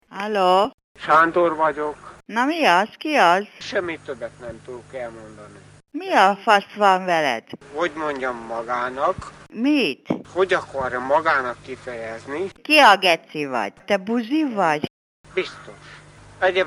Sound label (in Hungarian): telefon